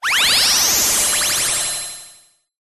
science fiction teleport data beam burst; zapsplat

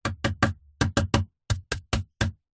household brush hit wood; zapsplat

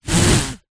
leisure party serpentine blowout hole broken; zapsplat

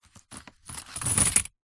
foley money coins tip into paper envelope

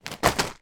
present wrapped put down; foley

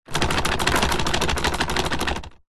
plastic hollow large shake rattle; foley

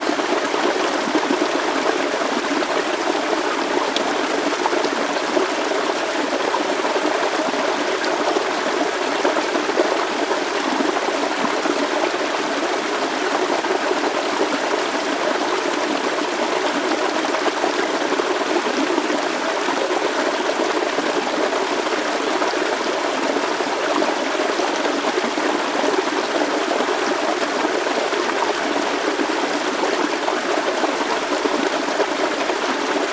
water stream waterfall small forest; sound effects one

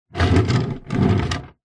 household drawer wood pine open close

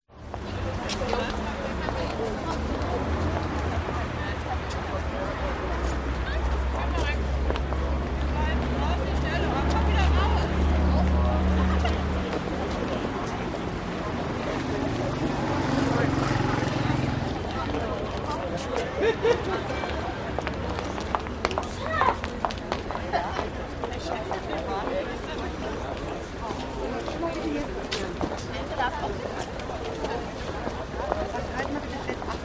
Germany City; audio hero

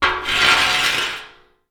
metal pole scrape on empty metal oil drum; zapsplat

